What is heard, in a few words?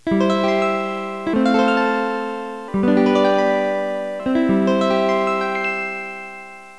strum